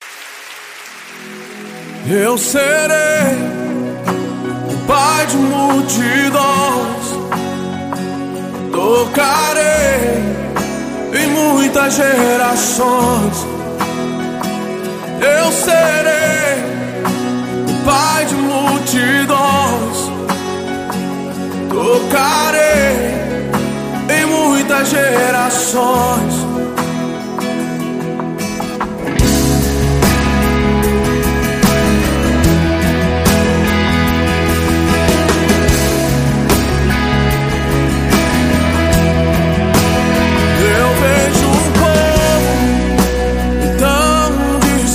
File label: Gospel